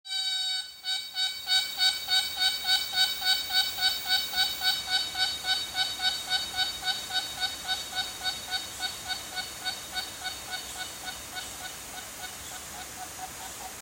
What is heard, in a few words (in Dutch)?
cicade